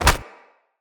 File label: Hoverboard Grab; Vehicles; Venus